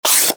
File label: PAPER